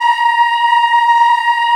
A#4 CHI IH-L, Choir; CHILD IHF

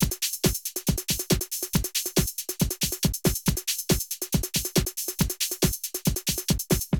Drum loops, Rave & Hardcore

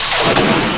scifi, SCIFI & SWEEPS; Techno Trance Essentials

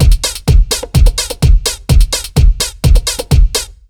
BEAT, Disco House; USB Soundscan vol.20; AKAI

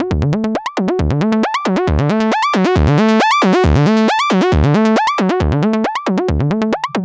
Synth; Sonic Foundry (Sony Creative Software); Prototechno; Synths

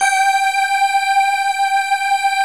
D50 Stak, Roland; 90s Sample CDs; Club-50 - Foundations Roland; D50 Syns

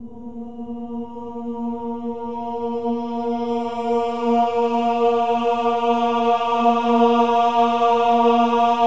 OH-AH A#3, choir; Spectrasonics; Symphony of Voices

